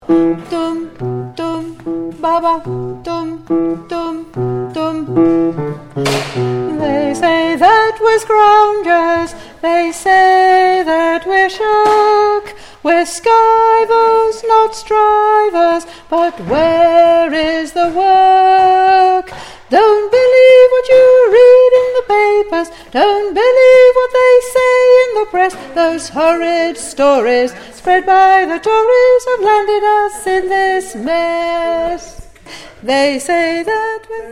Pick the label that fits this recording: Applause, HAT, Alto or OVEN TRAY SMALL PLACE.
Alto